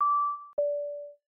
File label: Chime